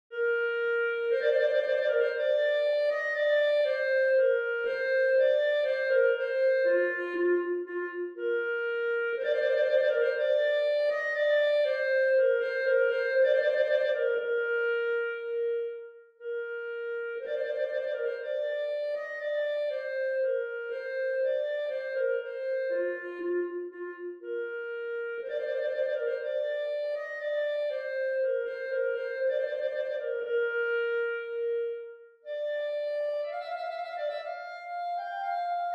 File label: trumpet voluntary; clarke jeremiah